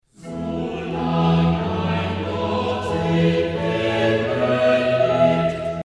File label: F major chorale; Bach